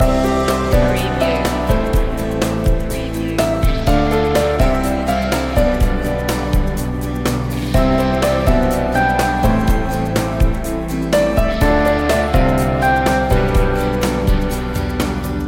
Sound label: casual goings loop